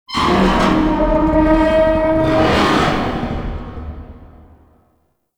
Assassinate Escalation Tank Vocal; Warframe; Nineteeen Ninety Nine

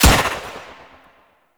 Fairy Weapon Shot

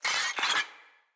Infested Ashen Vocal Pain

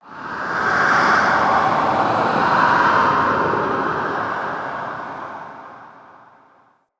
Death Wind Gust Low, Ambience; Red Night

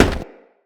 Crewman Footstep Run; Characters; Corpus; Crewmen; Footsteps

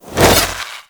Melee Whoosh; Warframe; Sentients; Sentient Trooper

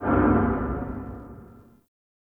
Low Mech; Warframe; Ambience; Nokko Colony

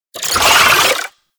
Wall Climb Movement Vocal; Warframe; Oraxia

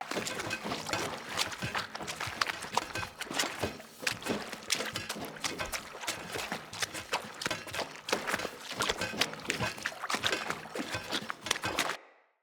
Corrupted Battle Dog Walk Loop Water, Footsteps; Animals; Foley

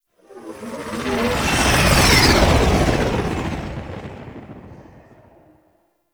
Blue Sky Liset Fly By Slow, vehicles; Warframe; Liset Flight

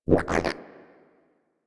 Mine Osprey Attack

